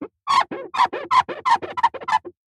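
vehicles car window glass fingers wipe squeak fast clean; zapsplat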